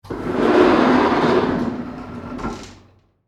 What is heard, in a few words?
Door Open Automatic, Foley, Door, Open, Automatic, Sliding